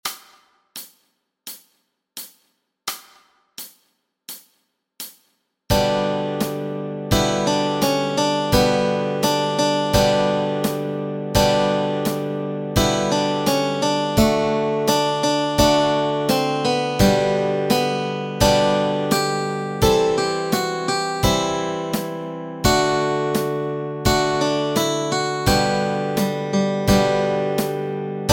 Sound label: Melody and Chords Track